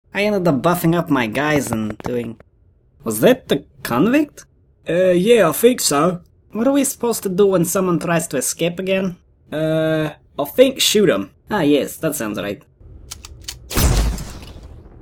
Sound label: Sprint; Fleeing The Complex; Storage Solo